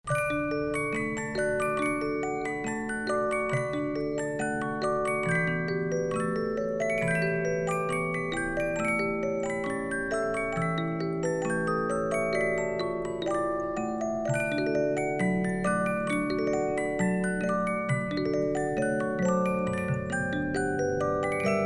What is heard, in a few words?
SANKYO; music box